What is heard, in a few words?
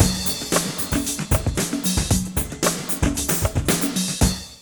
124, beat; AKAI S6000 CD-ROM - Volume 1